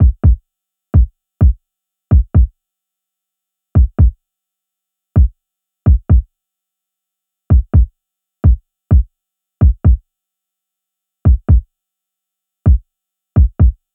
Kick

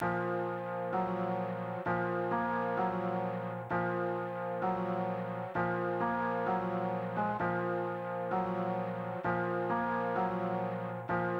Piano

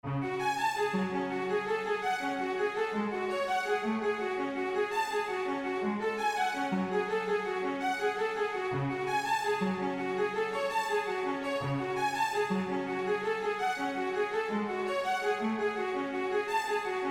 arp

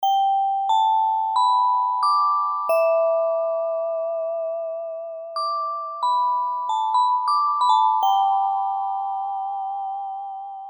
glock

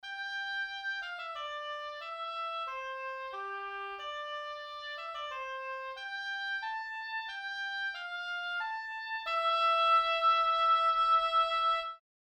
oboe; borodine

